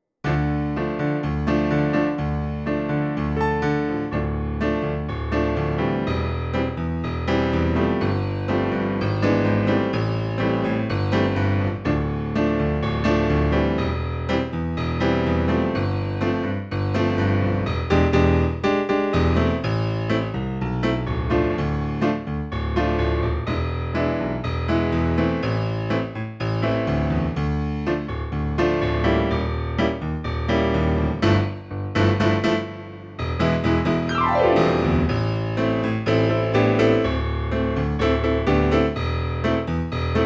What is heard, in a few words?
accompaniment